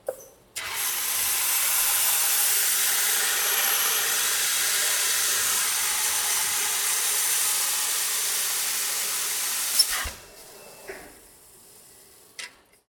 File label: machine mono; sonniss gdc